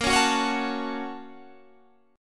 Bb Minor Major 7th Sharp 5th, Guitar, Standard; BbmM7#5; strummed; 18,16,16,18,19,17; JGuitar

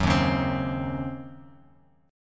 D sharp Minor 13th D sharp Bass Standard, chord; strummed; JGuitar

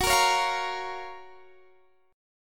F sharp Major 7th; F#M7 Chord; strummed; x,21,20,18,18,18

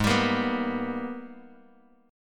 Ab Minor Major 9th, Bass; AbmM9; strummed

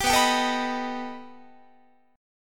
B Augmented 7th B down Guitar; strummed; JGuitar

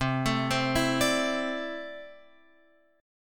C 6th Add 9th C down Guitar Open F; arpeggiated; JGuitar

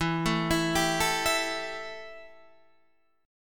E Minor Double Flat 5th E Guitar DADGAD

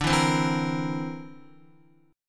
D 7th Suspended 2nd Sharp 5th D, Guitar; D7sus2#5 strummed

